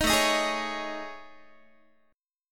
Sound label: A Major 7th Flat 5th, down Guitar; AM7b5; strummed; 18,17,18,18,17,x